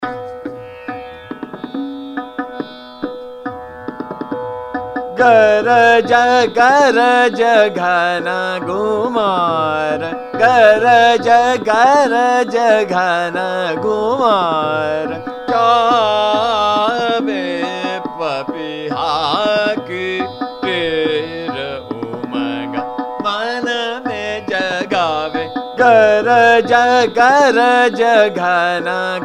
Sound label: Chota Khyal, Ektaal Drut; Hameer; Garaj Garaj Ghan Ghumar